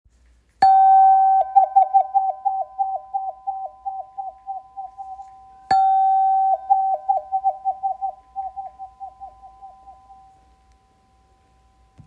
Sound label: Wahwah; gorge